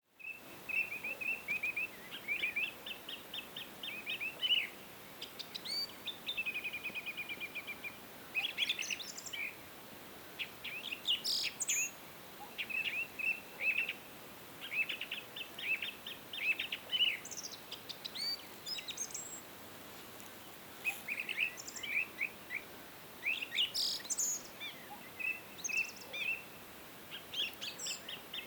evening birds singing in spring background sounds of nature